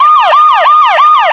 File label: siren; SHaR